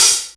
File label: OP Hihat; Cymbals; Open Hihats; VES1 Open Hihats